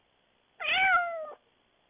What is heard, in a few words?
cat meow